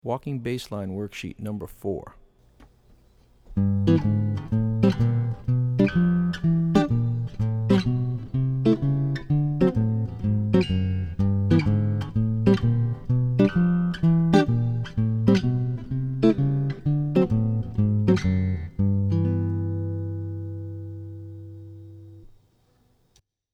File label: Walking Bass Lines; jazz chording